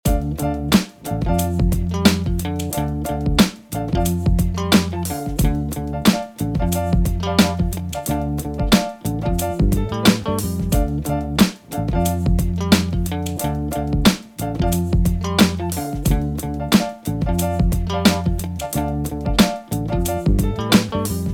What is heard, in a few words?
Song HipHop